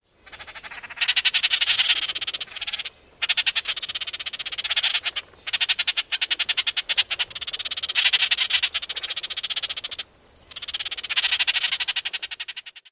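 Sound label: chatter of immatures, oriole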